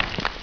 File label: chainsaw pullout